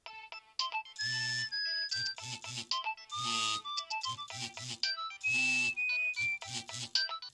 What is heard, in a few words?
nokia 6100 ringtones; espionage